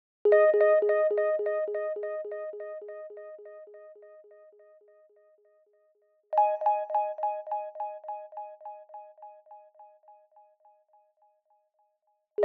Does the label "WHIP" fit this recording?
No